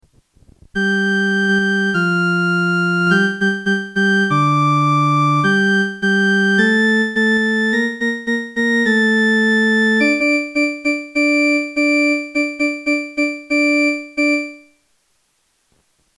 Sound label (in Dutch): herfstliederen hejo span de wagen aan instrument eenstemmig; muziek; herfstbundel